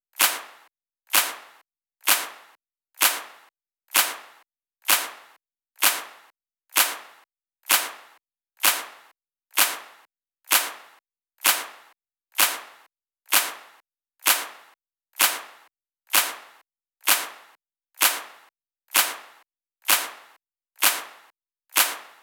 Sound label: 128BPM Clap Dry; Essential Clubsounds Vol.5; Let's Work It Kit; Root F#min; Drums & Perc